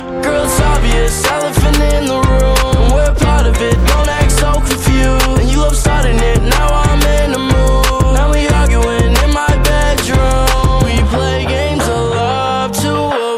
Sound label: US English Ringtone